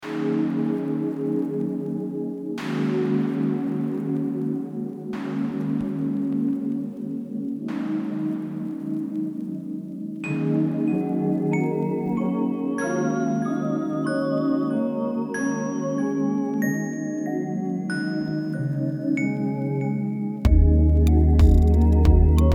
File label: pentatonic